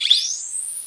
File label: loop strt, srifle open; weapons; SiN